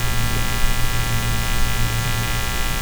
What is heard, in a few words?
buzz loop